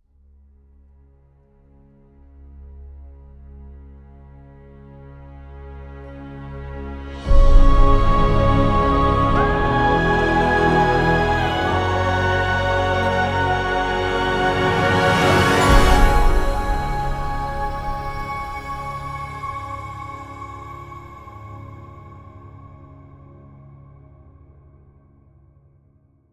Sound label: Stinger; inkwater Marsh Get Sword Ability